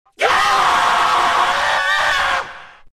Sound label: scream scary